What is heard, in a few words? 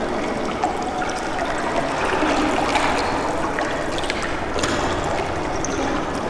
drainage water laps loop, ambience; ambient; Left 4 Dead 2